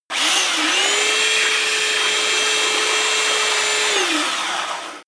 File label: sander on load light squeak